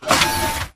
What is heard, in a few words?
Stalker Mvmt Servo Damaged Light Medium; Titanfall 2